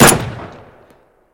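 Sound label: boevoj odinochnij vistrel iz vintovki, combat single shot from a rifle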